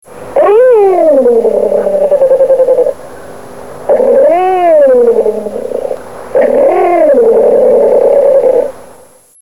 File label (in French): guepard jachtluipaard; WWF Rangerclub